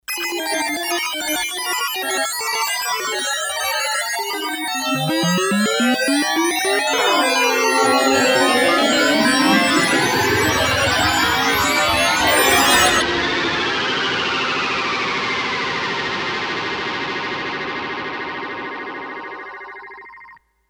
Modula, Kawai K5000S factory presets

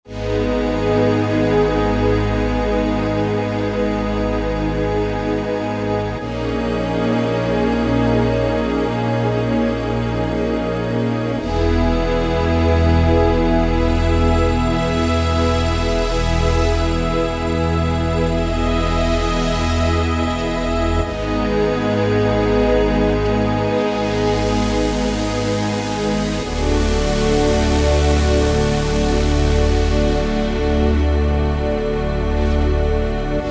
Hybrid Strings, Roland JD-990; Factory Patches

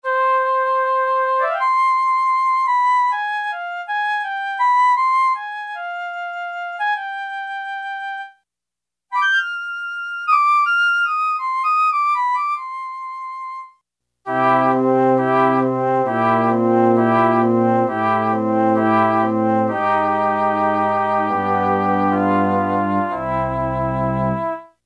Flute, Roland U-110; Factory Patch

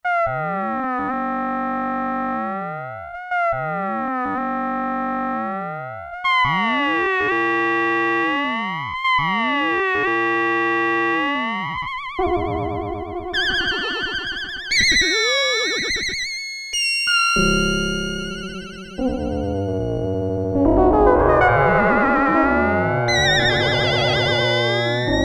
SFX, Sequential Circuits Six-Trak; Factory Programs

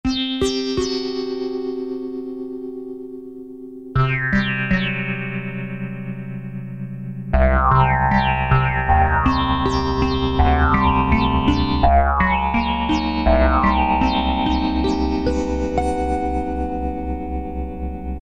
Vibra Harp, Korg N364; Factory Prog demos

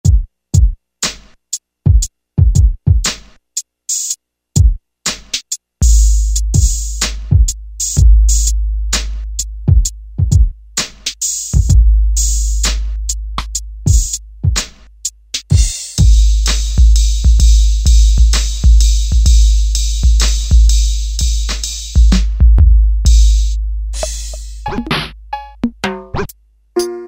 Style Kit, Alesis Z1 Hip Hop

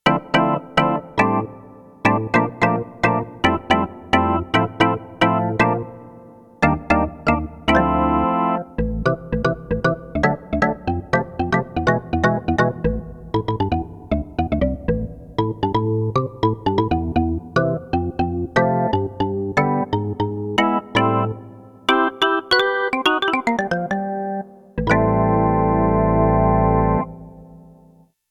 JAZZ ORGAN, Roland JX-1; Factory presets

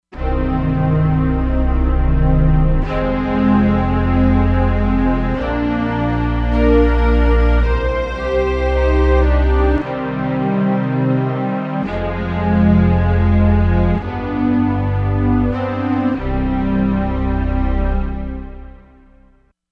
Arco Pad, Korg MSC-07S Synth; Korg MSC-07S Synth 2

